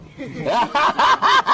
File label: seeman buhaha